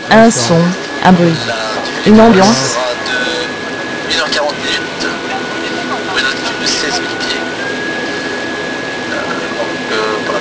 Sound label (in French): Un son un bruit une ambiance